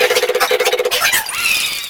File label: Fan Chant; Mario Paint